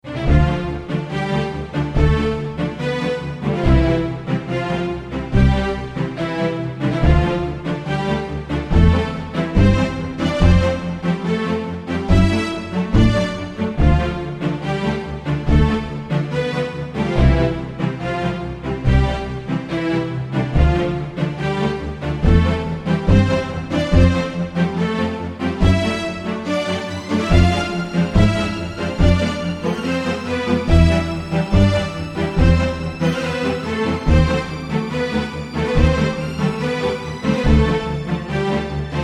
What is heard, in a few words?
Fast Cellos